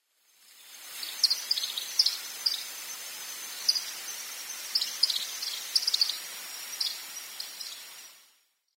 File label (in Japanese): shiragahojiro, bird-research